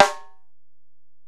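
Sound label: Acoustic Snare, Alesis DM-PRO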